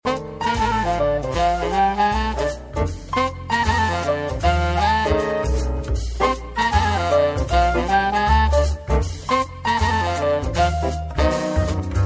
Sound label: Blues